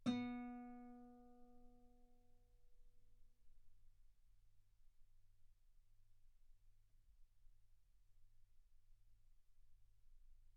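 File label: Guitar ff B string B3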